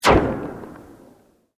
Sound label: Sweep Fast; Created sweeps, swooshes and other movement effects; Sweepers; Sound Design; Foundation4